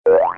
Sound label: boin